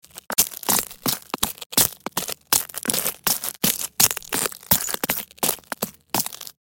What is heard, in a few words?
High heels running on broken glass